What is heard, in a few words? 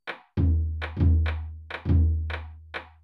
Taiko Drum